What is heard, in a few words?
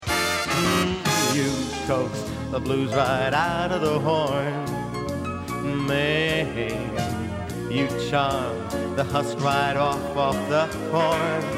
Swing